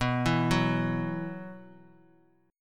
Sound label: B Suspended 4th; Bsus4 arpeggiated; JGuitar